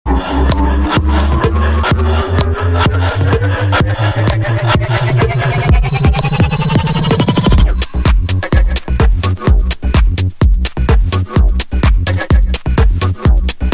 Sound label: minimal